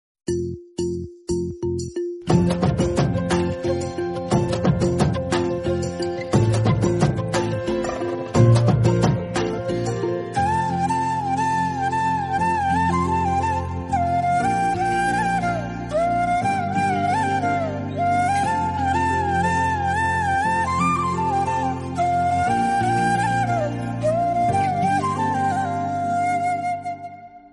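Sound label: background music; Policeodu; Telugu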